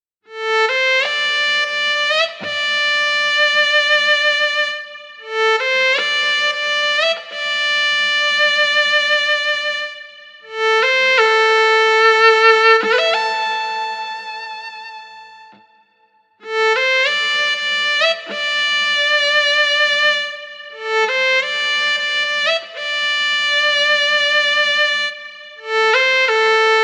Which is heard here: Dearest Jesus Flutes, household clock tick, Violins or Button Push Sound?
Violins